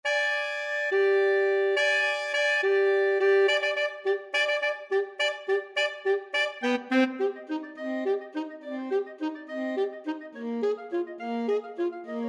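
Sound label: danse macabre saint saens, clarinet saxophone duet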